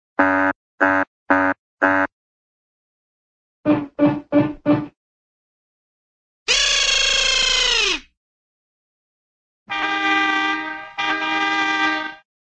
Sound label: horn, cartoon old bulb horn